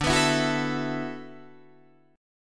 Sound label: D 6th Add 9th D, Guitar, Open D